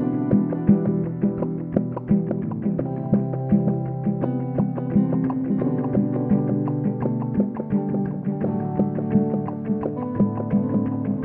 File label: lounge bubbles